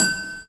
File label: kricket, Clavas; Misc Percussion